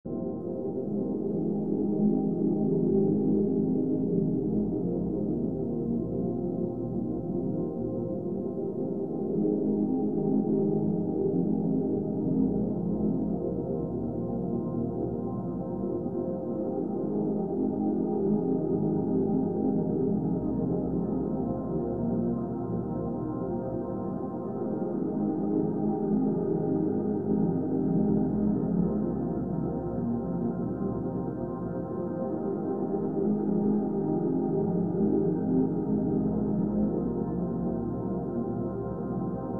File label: ambient